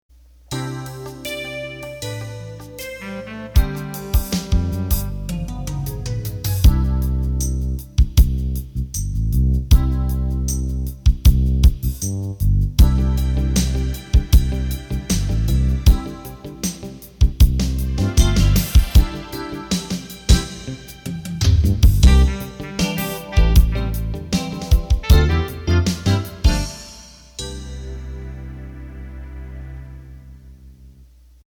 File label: Beat Slow